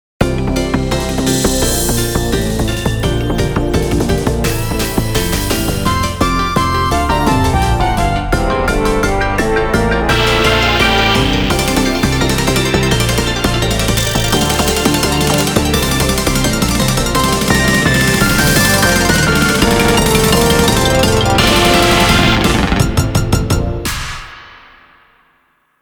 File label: Instrumental